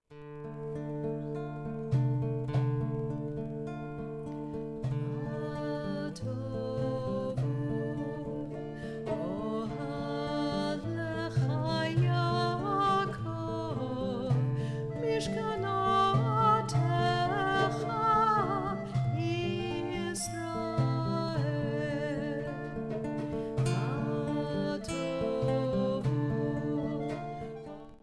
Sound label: Ma Tovu folk tune